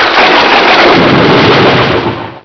thunder

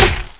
BOW HIT

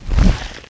claw

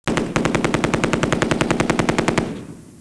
machine gun

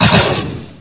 shot

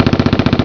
BULLET

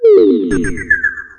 alarm

